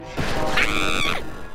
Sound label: jumpscare; IRyScream